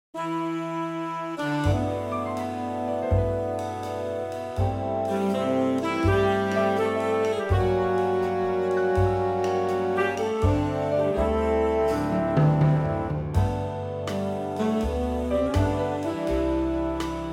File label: big band; key - G - vocal range - G to A; Wonderful big Band arrangement of the country classic